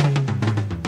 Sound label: fill, Drum Kit Fills; Crowdsourced Sample Pack